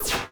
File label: object close; ChromeVox Classic Sounds